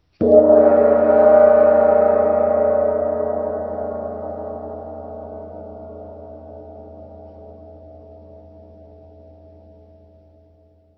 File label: wind gong